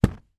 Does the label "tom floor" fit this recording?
No